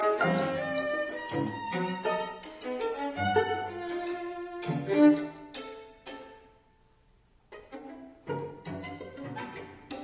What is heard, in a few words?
String Quartet, Delicately Leisurely; II Comodo; Shifrin